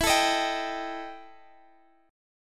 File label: E Minor Major 7th Double Flat 5th; strummed; EmM7bb5; x,x,14,14,16,15